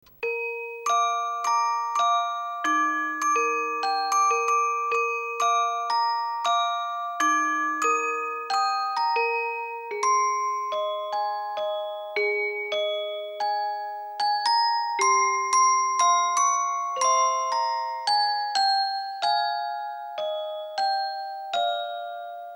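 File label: music box version; Cowboy Bebop; Orgel Version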